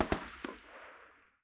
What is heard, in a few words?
combat atmosphere; World of Tanks